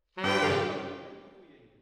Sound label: MKH AB, piano